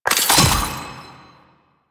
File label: Key Pickup; Warframe; Nineteeen Ninety Nine; Exterminate